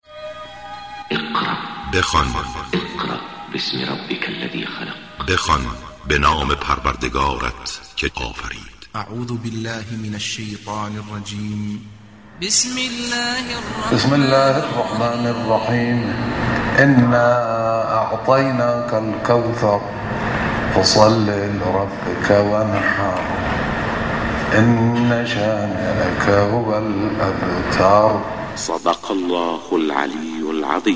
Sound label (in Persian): تلاوت سوره کوثر